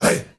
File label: HEY HEY